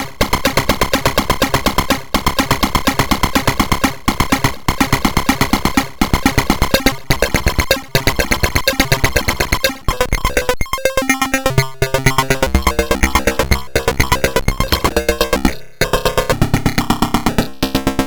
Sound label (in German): Error Instruments Drums Chip Tune Gate manueller X Parameter